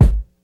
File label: clear rap kick drum sample g sharp key